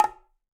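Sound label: Bongo High Flam; Latin Percussion; Drums; Drum Hits; Ableton; Factory Packs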